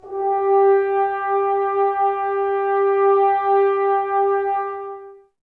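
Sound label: Brass French Horns, Woodwind Brass Ensemble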